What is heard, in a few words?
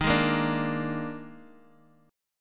Eb Major 7th Suspended 4th, Guitar, DADGAD